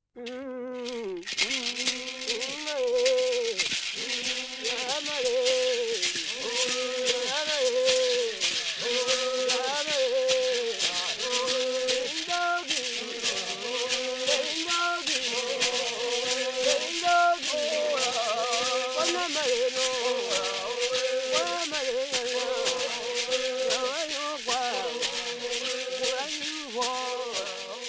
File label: Bariba and Somba Music; Sambani